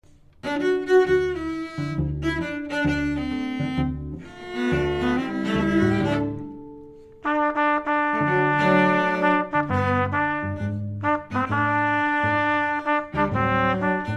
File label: Trumpet Solo